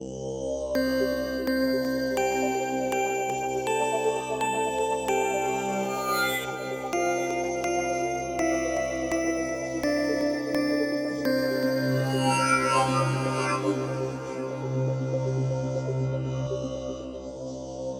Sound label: Horror Lullaby